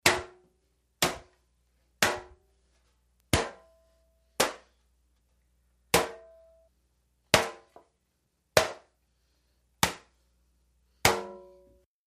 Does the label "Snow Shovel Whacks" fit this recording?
Yes